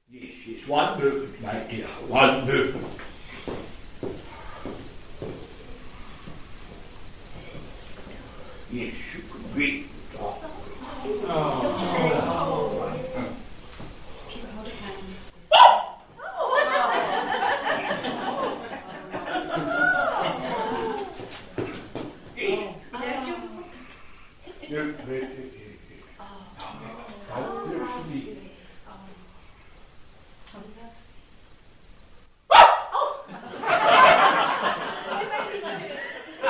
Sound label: dog